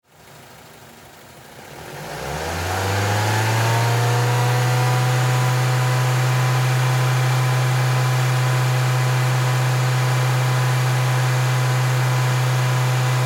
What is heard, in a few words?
Car Mazda 3 High Rpm; LYN110 package of Power Car sounds; LynneMusic